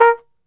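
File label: A#2, flugelhorn, brass; Best Service; Brass Super Section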